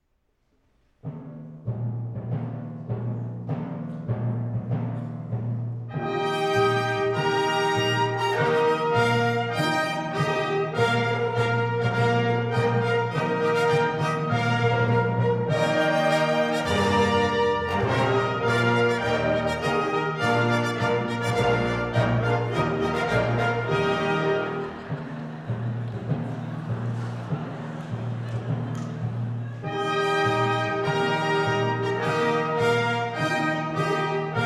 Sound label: Fanfare